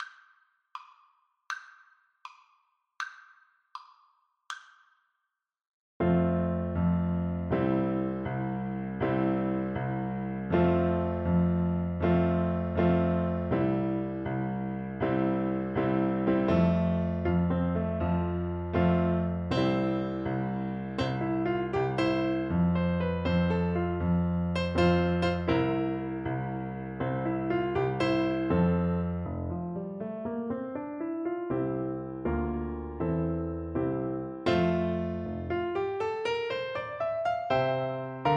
chamarita FL kar, Flute

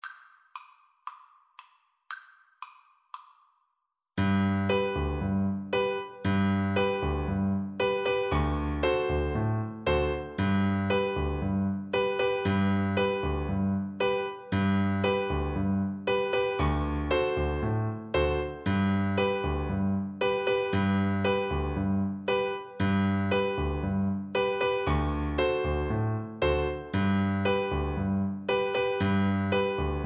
mango walk, Viola

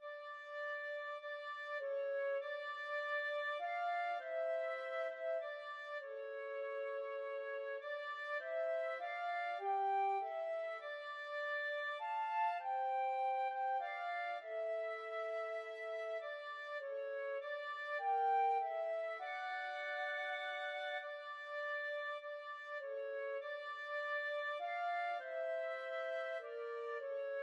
coventry carol flute clarinet duet

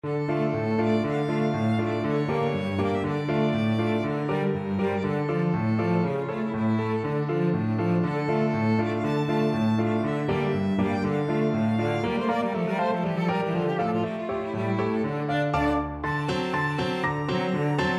hungarian dance, piano trio; brahms

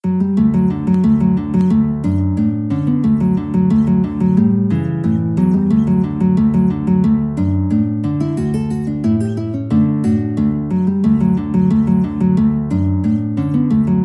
soldiers joy guitar

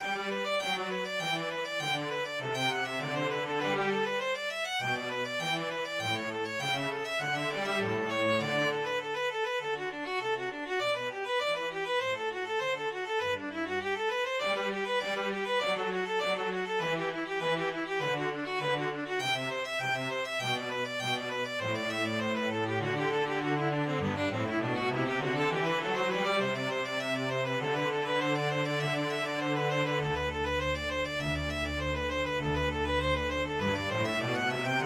violin cello duet

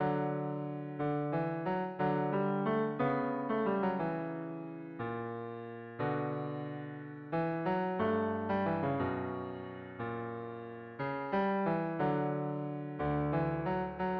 duos, bassoon duet

